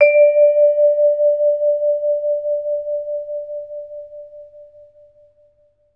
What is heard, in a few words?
VB VIFS D4, VB VIB FS; Best Service; Peter Siedlaczek's Advanced Orchestra